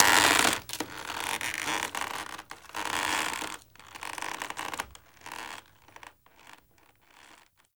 Rope Creak Hang; Warframe; Ambience; Grineer; Carnival; Carnival Ride Scares

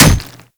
Grineer Manic Claw Hit

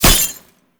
Weakpoint Impact; Enemies; Gameplay; Lasrian Tank; Warframe

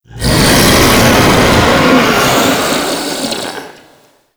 Charge Scream; Warframe; Stalker

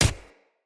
Short Sword Impact Player Flesh; Weapon Impacts; Enemy; Swords; Sinecure Short Sword

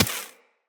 Laser Impact Generic; Weapons; Bullet Impacts; Surface

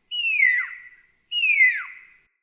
Night Creature Medium; Ambience

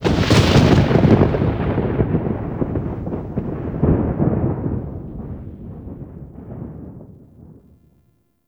Thunder Clap; Ambience; Warframe; Eidolon Plains

